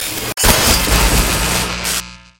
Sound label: enemy special